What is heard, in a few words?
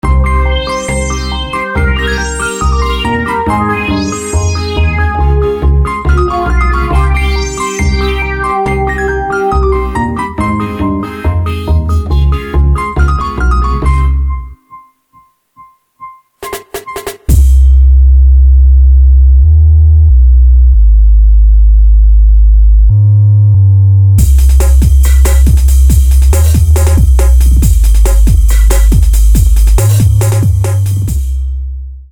drum